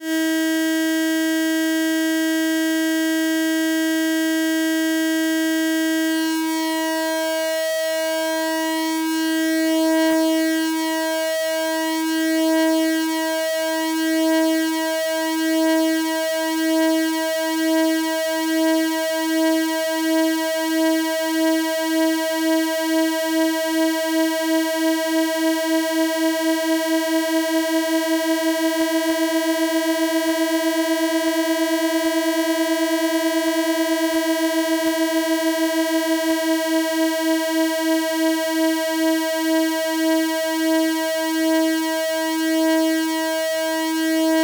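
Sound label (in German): verstimmen sägezahn; harmonie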